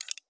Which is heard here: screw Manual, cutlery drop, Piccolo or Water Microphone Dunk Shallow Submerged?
screw Manual